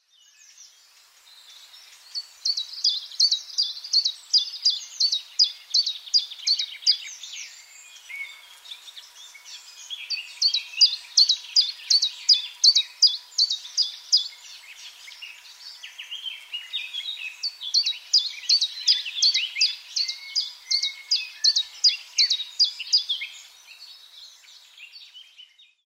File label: common chiffchaff